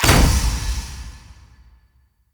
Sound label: Bonus Damage; Weapon Impacts; Sweeteners; Virtue Bonus